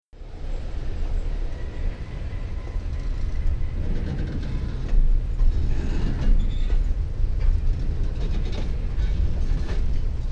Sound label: departs Craigieburn, Railways